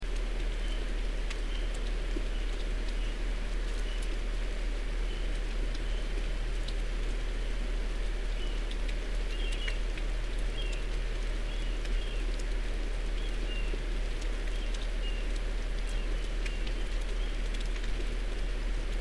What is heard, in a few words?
river otter mystery calls